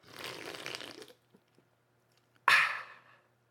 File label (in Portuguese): energetico